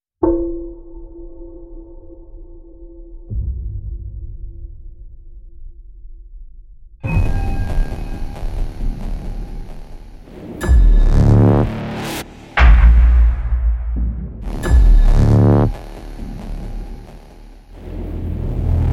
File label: Epic Movie Trailer Cinematic Sound Library